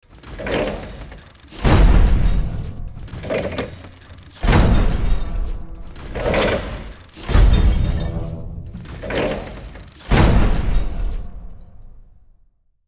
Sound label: Heavy giant robot footsteps walking; robotic